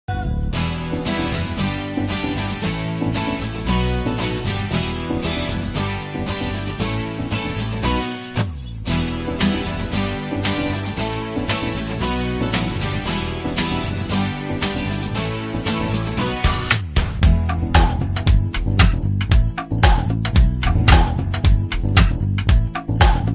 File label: movie bgm attitude ringtone telugu ringtones; athadu movie